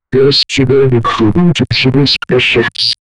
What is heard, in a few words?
flanger; SFX Engine